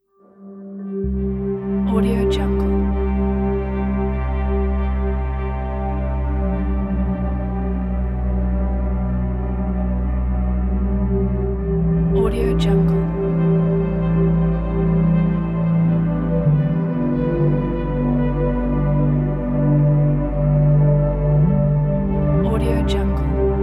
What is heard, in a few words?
cinematic soundscape